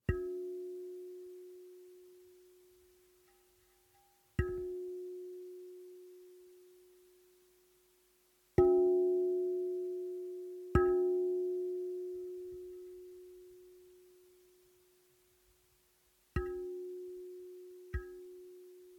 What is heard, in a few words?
contact small metal box lid subtle hits with finger resonate mono; SoundHolder